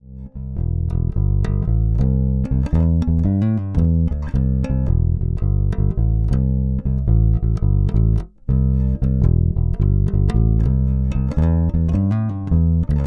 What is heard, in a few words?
MTD 535 Neck Pickup